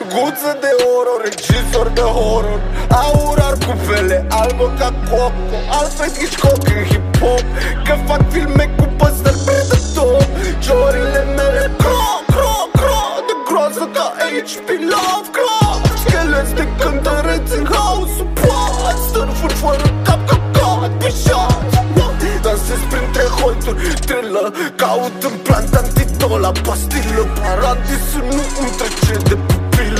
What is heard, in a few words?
Is Calling Rap